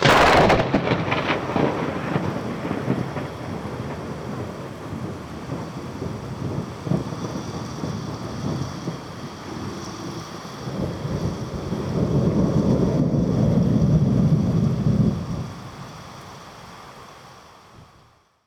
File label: Thunder Strikes Me; nvidia gameworks; waveworks